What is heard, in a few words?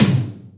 Boos TH, BossDR660 ht; drum machine